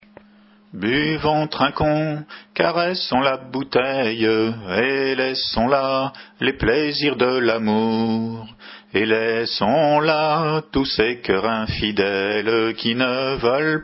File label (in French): Chant Gallo, Chant à Répondre